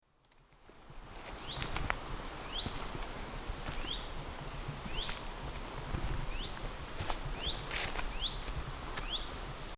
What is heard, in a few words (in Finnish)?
tiltaltti; linnut